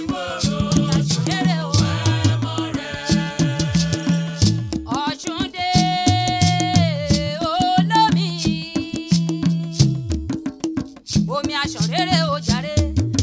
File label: Bata Drums Ensemble